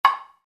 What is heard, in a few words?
temple block set; black swamp temple block set 5; log drums temple blocks